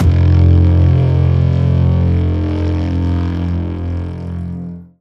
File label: bassy, Synths; Notes